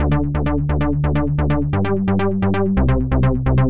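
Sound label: Synth Bass 130; Audacity; loops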